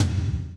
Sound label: TOM TOM, Roland